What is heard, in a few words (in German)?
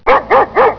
hund